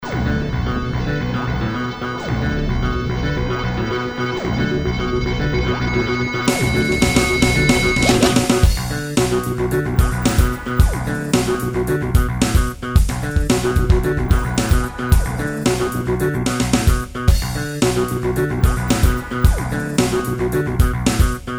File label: rock